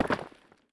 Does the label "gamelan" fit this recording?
No